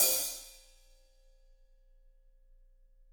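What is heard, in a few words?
hi-hat, Drums; Bob Clearmountain Drums I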